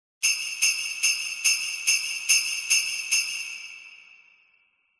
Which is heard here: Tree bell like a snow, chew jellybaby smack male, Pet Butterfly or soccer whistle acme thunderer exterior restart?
Tree bell like a snow